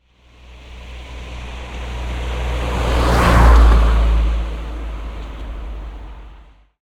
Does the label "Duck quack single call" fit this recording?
No